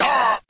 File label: "ah", Voice